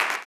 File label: CLAP, Blues Soul; Spectrasonics Vocal Planet